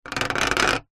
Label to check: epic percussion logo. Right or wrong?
Wrong